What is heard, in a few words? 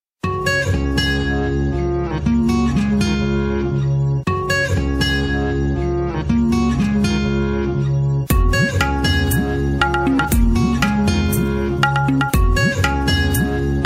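background music Ringtone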